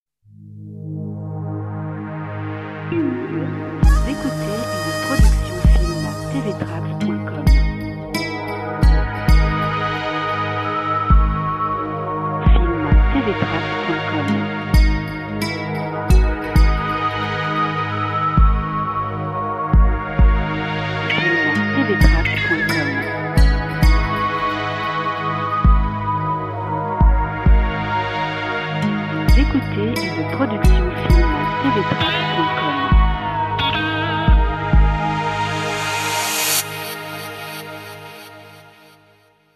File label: Newage